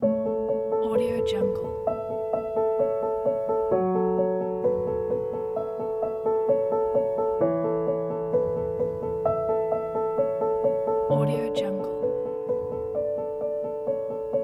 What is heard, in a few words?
inspiring piano and strings